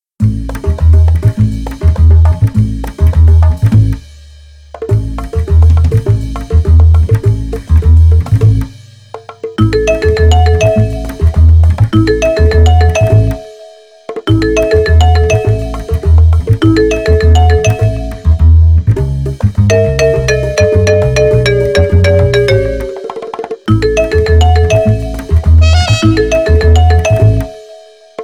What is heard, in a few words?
Marimba; 밝고 맑은